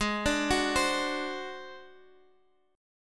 G sharp 7th Flat 5th G sharp down Guitar Half Step Down, chord